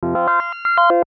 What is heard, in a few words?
BLIP SWEEPER; Beep; Multimedia; Beeps